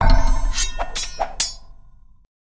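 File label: Build Japanese Samurai; Civ4 warlords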